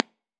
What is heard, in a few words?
guitar amp